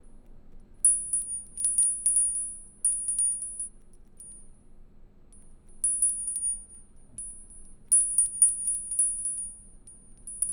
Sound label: Little Brass Bell